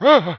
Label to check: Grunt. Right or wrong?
Right